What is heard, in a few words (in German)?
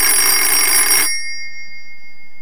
klingelton fetap 751